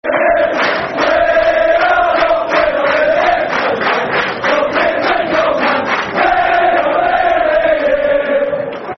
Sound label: We're Not Really Here, Football Chants; fanchants; Man City Mcfc